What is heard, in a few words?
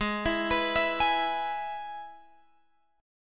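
Ab Minor Sharp 5th chord, Guitar, Drop D tuning; 18,19,21,21,21,x; down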